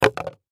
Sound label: Gift Box Lid Drop; Lids (Sound Effects:Objects); Blastwave; Foley